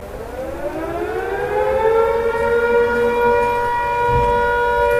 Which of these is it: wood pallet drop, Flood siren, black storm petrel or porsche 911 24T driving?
Flood siren